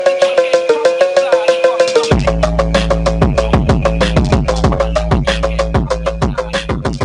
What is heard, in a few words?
bass